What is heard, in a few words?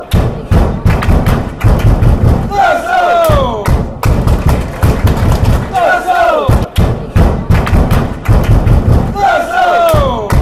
thistle clap